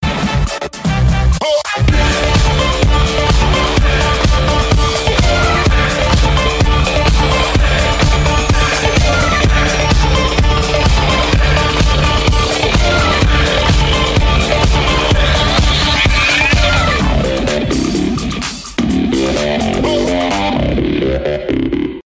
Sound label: Outro